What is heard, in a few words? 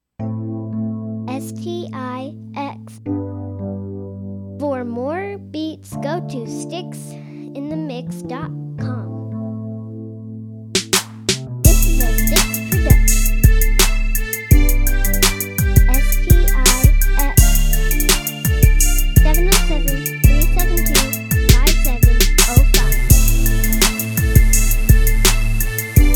crunk and b